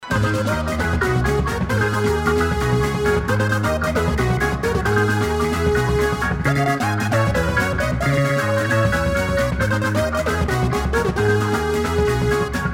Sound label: Organ chords section B